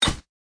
Bite canine

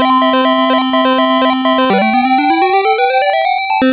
Level Start; Indiana Jones and the Last Crusade; Master System

